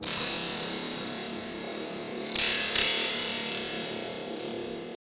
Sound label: summon